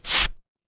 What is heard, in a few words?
paper fabric tear